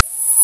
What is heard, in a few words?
TV On; Glider PRO